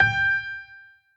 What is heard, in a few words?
piano echo